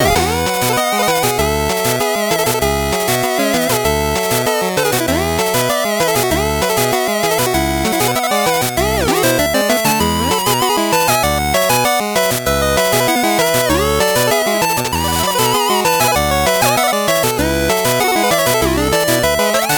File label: 8bit bgm fast